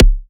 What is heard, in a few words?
kick